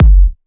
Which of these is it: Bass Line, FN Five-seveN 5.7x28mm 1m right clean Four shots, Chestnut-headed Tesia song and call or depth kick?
depth kick